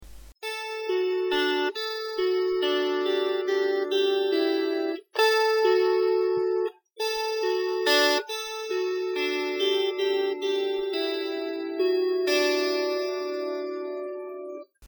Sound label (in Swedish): melodi, Kantele